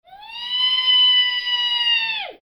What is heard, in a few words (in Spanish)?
Chillido; Banco de Sonidos - Facultad de Art; Lazzeri - Gallichio - Alperte - Calvo